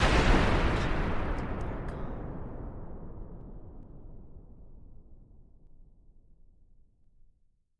Sci-Fi Explosion; SoundsCrate

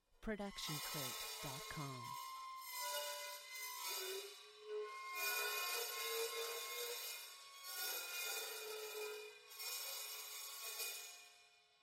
hi hat 14 inch scrape; soundscrate

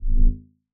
large space ship short rumble; soundscrate

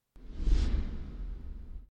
Soft Whoosh; SoundsCrate

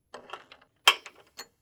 door handle turning; SoundsCrate

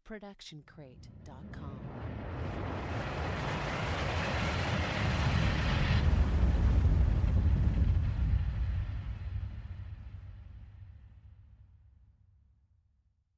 Noise Lifter; soundscrate

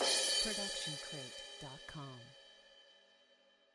crash cymbal 16 inch; soundscrate

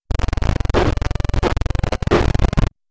bonus glitch

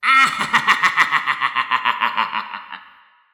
evil laugh; SoundsCrate

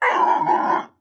dinosaur grunt; SoundsCrate

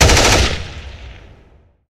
ak47

